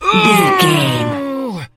voice male effort struggle

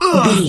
voice male hurt pain

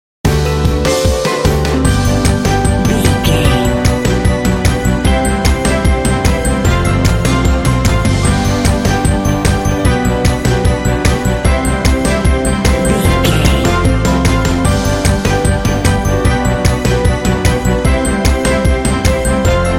pop inspirations bumper

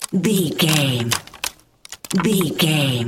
wood tree branch move

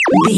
retro collect pickup item

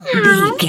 cat meow

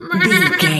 sheep baa

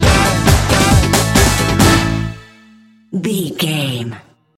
rock body sting, Dance, EDM, Club, Energetic; Exercise, Aerobic, Aerobics, Spinning, Workout, Disco, Eurodance, Confident

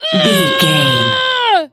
voice female a battle shout

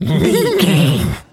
horse neigh calm

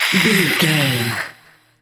ghost witch voice hiss

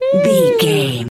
voice fun small character emote interested

